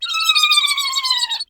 voiceless crying

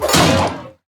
impact

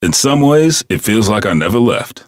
bigband

